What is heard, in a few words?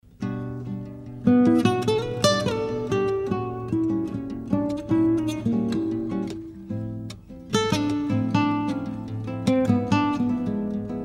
solo